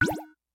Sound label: Match Fail; hello kitty friends match; android